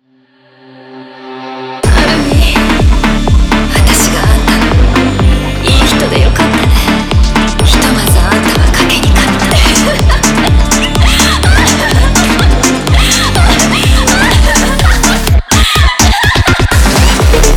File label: Phonk